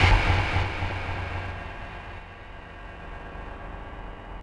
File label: echo, Drum N Bass; Sonic Foundry (Sony Creative Software); Knutrix Drum & Bass